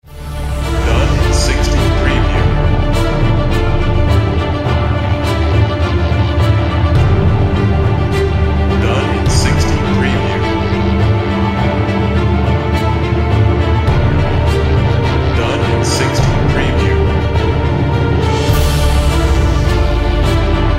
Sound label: Epic Battle And War Music